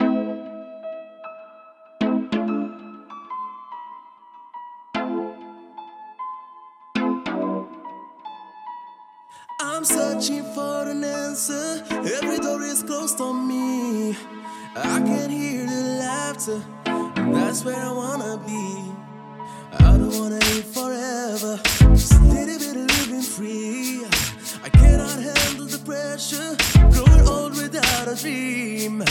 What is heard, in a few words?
chillout downtempo mix; Moonlit Whispers